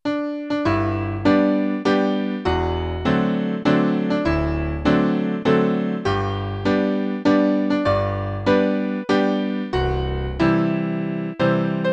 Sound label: (Piano) Happy Birtyday